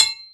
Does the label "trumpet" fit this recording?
No